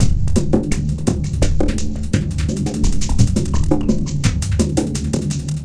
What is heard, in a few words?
GLOBAL TRIBE GROOVE; Sonic Foundry (Sony Creative Software); Vir Unis Infusion